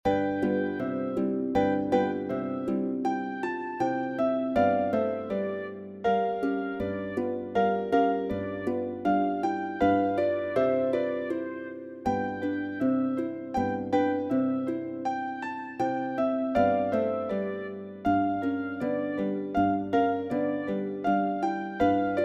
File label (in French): Chanson pour les enfants orch